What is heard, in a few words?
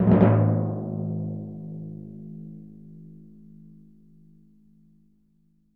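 timpani A#, Synclavier Percussion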